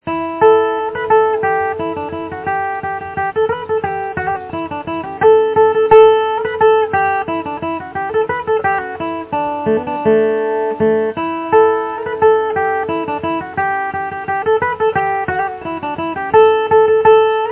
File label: duckpond, flatpick; tablature